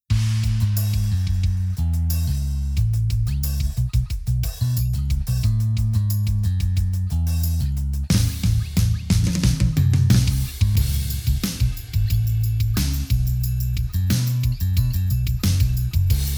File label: DRUMS BASS